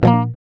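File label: GUI A#, acoustic guitar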